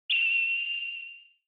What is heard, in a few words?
Retro Anime Accent Mid Short